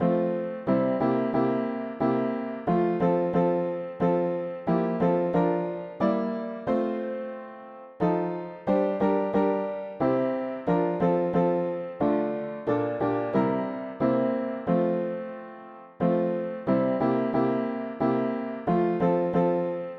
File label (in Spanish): mono piano; hymns; Himnario pequeno 2Pte